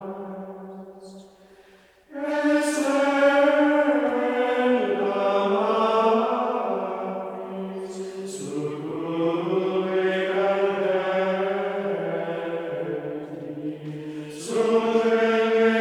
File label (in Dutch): Koor (mannen) Gregoriaans; Alma Redemptoris